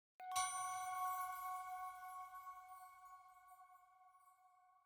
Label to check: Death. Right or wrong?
Wrong